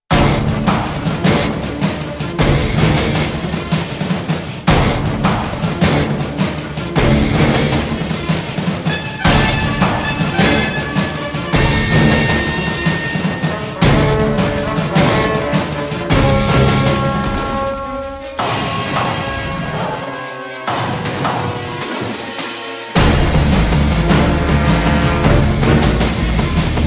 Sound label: battle; wot